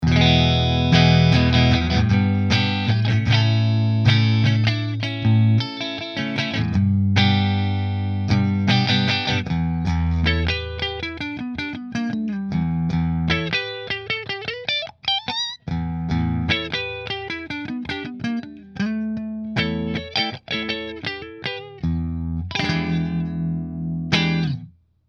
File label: Nola Model 8 Middle Split Fender, guitars; New Orleans Guitars Model-8 Natural Finish